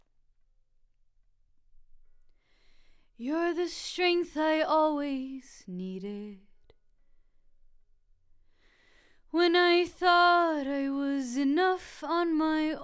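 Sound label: vocals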